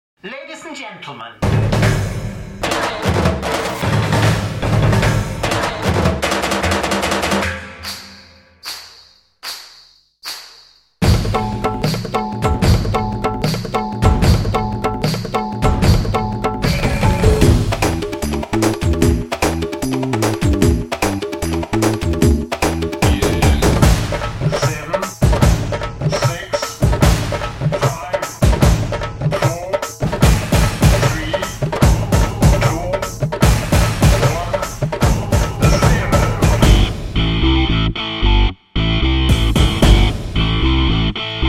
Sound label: Instrumental